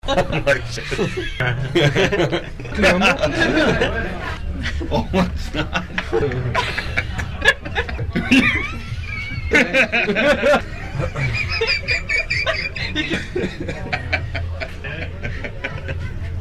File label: laughs